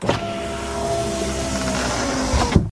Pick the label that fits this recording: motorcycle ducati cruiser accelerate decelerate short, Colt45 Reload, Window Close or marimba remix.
Window Close